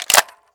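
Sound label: bolt back, rifle; l85; sven; cof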